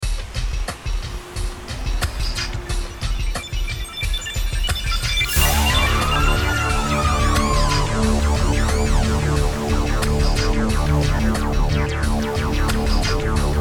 Relaxing; Serious Sam 2

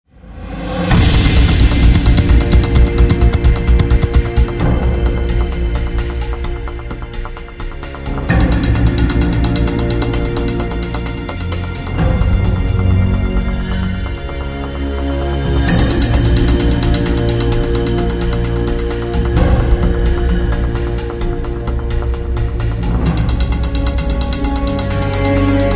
main theme tension loop; Call of Duty Modern Warfare

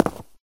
footstep concrete land; ProSoundCollection v1 3 MONO Footsteps

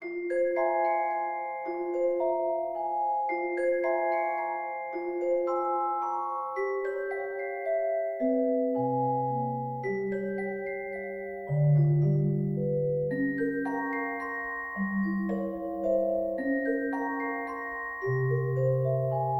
Music Box; Roguebook

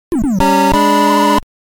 Death (8-Bit)

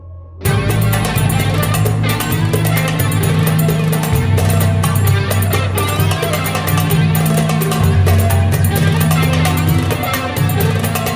music level undersea Tower; freedom fall

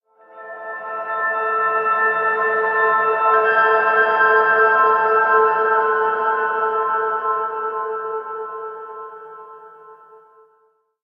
Map Piano; No Man's Sky

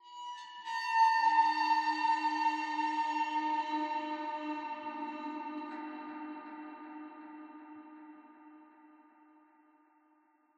Interior Metal

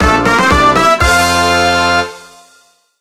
Happy Short; Dungeon Village 2; Android; mobile; Windows

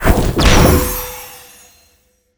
SFX spell harness magic

